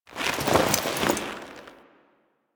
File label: Move Foley Long B; Warframe; The New War; Veso; Quests